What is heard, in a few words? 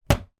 household cupboard door small unit close